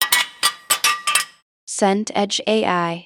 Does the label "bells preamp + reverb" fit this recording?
No